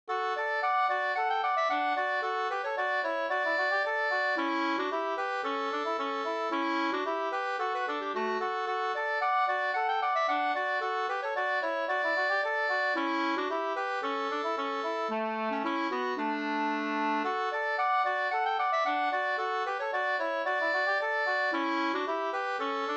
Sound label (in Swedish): polkett; Steppen Vb 2st